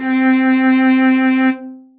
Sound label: Cello Solo, Orchestral Strings; Ableton